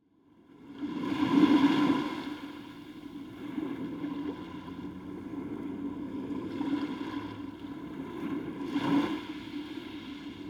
Ambience Through Seashell, Sea Waves on Beach Shore, Bottom Inside, Isle-Aux-Coudres; Canada; Seashell Resonance; Articulated Sounds